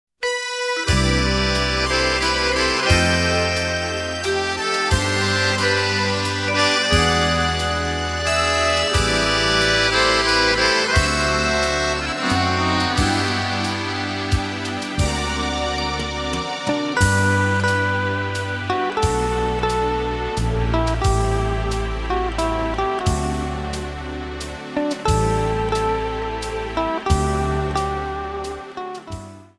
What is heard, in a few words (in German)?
orchester; wiener melodien